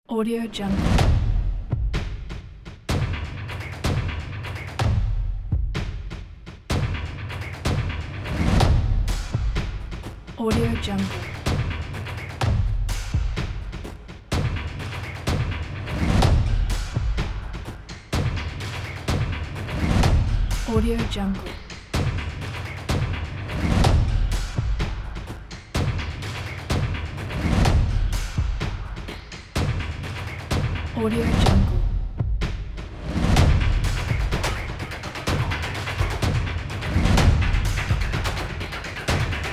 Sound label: epic cinematic drums